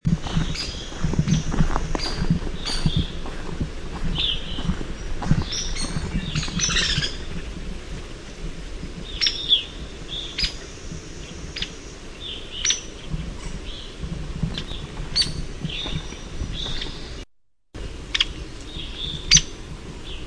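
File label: Hairy Woodpecker peek whinny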